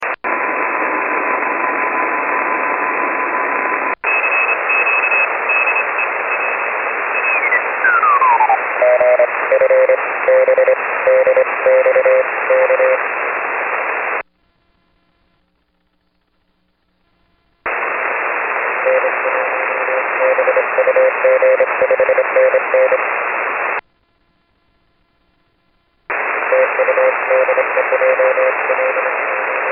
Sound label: 6m; JL8GFB (12 June with brief clip of 49.750 UA0 video at beginning of file)